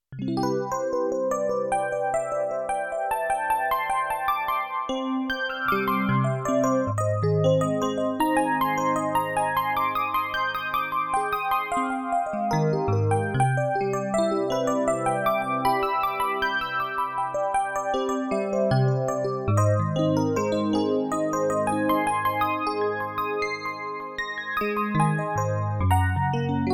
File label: Epiano